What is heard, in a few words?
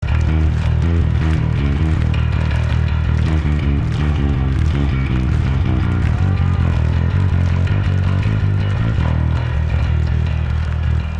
Bass Solo